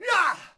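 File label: Cbmn Attack Vox; Civ4 warlords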